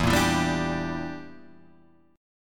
E Minor 7th Flat 5th E

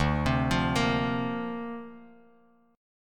D-Minor 7th Sharp 5th D Bass Standard, chord; Dm7#5; arpeggiated

